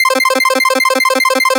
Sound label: 8Bit 150BPM 1 Bar Arp C; 8Bit Sounds; Antidote Advent